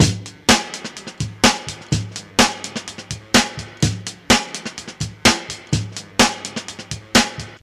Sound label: 126 bpm fresh drum loop g sharp key, Free breakbeat sample - kick tuned to the G# note; drum loops